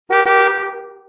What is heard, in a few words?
animal